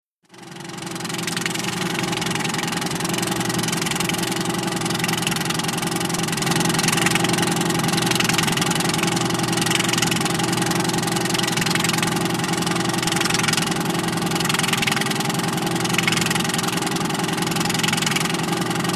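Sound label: Outboard Motor Int Idle, motorboats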